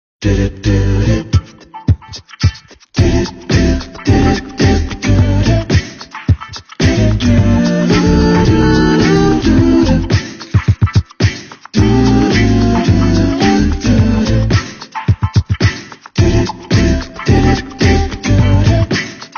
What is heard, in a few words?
Vocal Tone